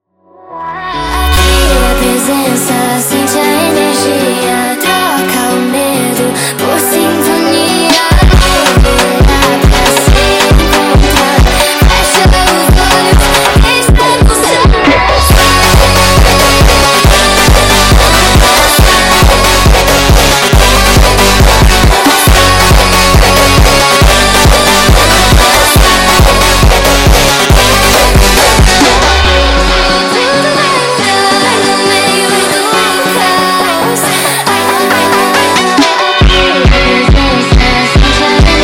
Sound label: Montagem sped Up